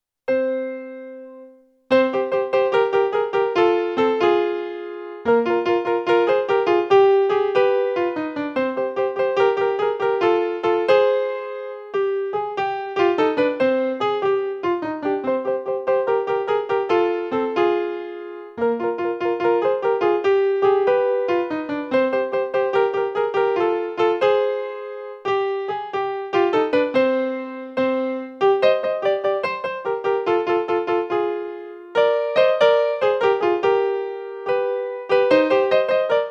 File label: sisu accompaniment